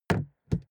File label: suv gas cap close; verkehr transport; autos